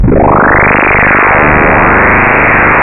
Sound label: fly a spaceship